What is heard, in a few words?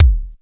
D70 BD; D70 DRUMS; Crypton - DrumBase5000